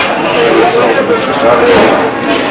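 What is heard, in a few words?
street ambience; WhiteOut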